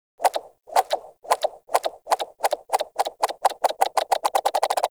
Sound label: Pit Monster Countdown Five; Warframe; Infested